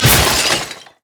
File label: ice shard; LoL; lissandra